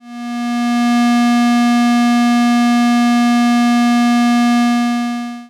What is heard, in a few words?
Square wave